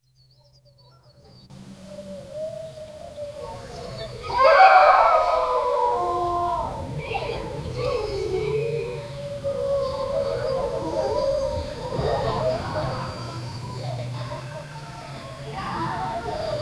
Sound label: ambience countryside